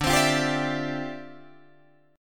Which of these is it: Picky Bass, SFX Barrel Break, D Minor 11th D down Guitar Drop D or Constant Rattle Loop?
D Minor 11th D down Guitar Drop D